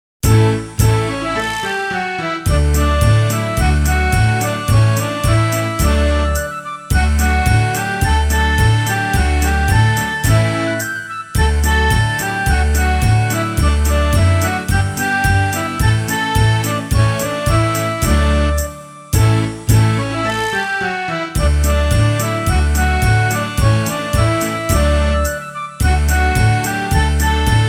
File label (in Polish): Przybyli ułani pod okienko, Karaoke